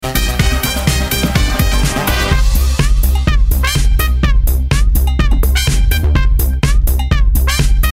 party song; osn

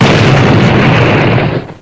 explod

